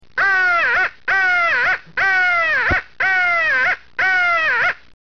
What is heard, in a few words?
oh yeah, The Oh Yeah Call, crow